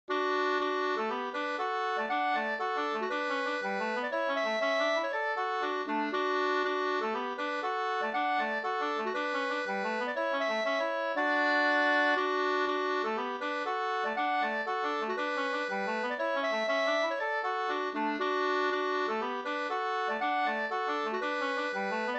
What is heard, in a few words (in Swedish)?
hamburska, 2st; Kavelbrolaten; Leffler nr36 Sd